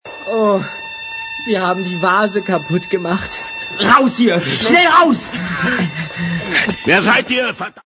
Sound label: aargh